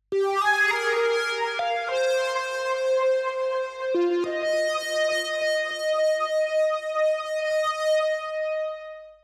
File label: Melodies; simple session